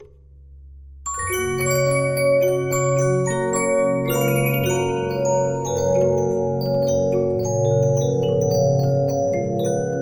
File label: Regina, 15inch disc musicbox